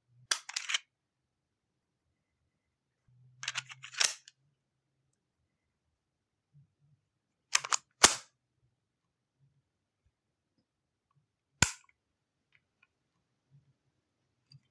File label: luger dropmag loadmag cock dryfire